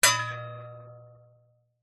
impacts metal solid plate disc hit vibrate resonate